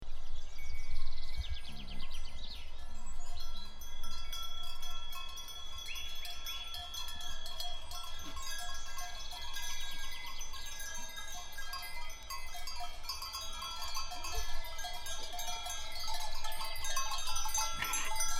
Nodar Portugal Goats Herded By

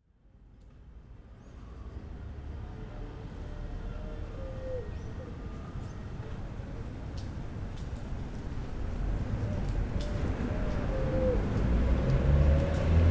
Lima Barranco Doves

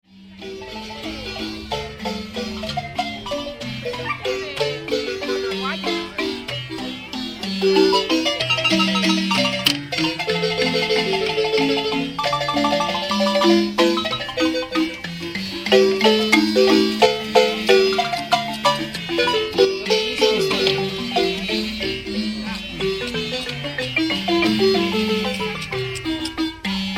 marimba

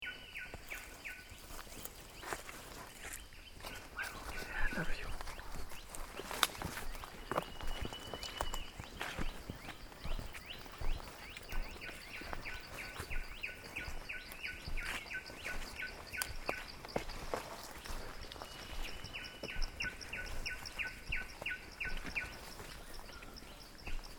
jungle walk

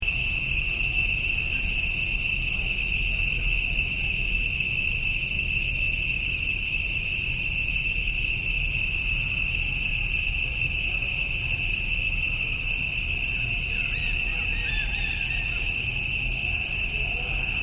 cicada chorus, cambodia

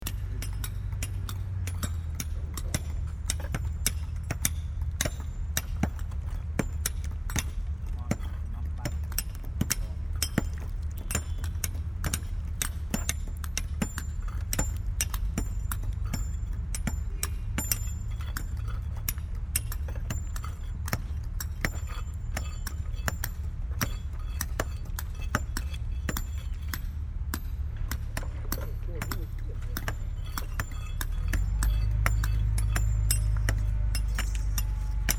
restoration work

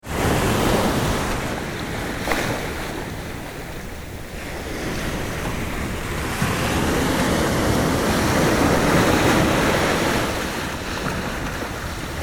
Shorebreak

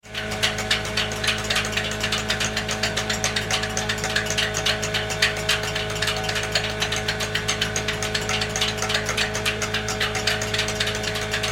rice grinder

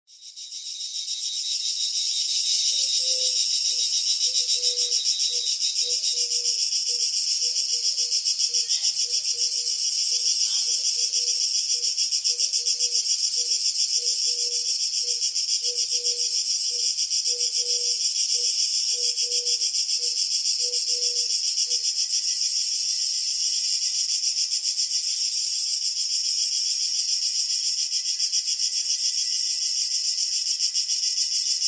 canary crickets

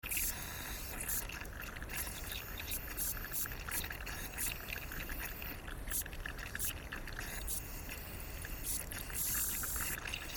Hissing pipe Annapurna Nepal

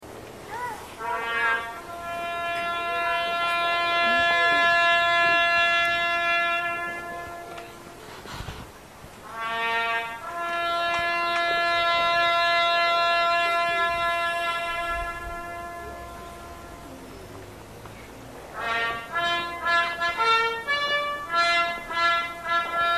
Winchcombe Last Post, Cotswolds UK

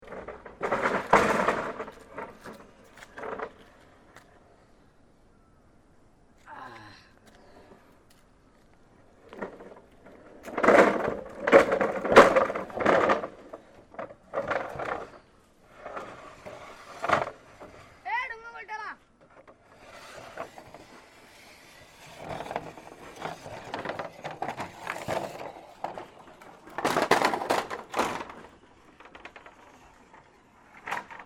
bamboo dragging

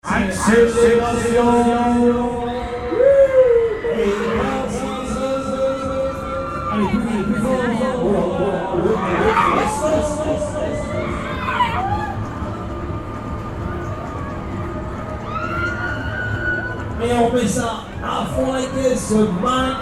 Brussels Fair

